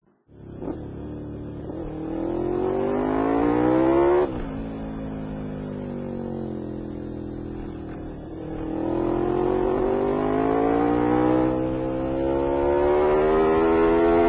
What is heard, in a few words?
honda 750 vfr int; Honda 750 VFR au guidon; GSX-R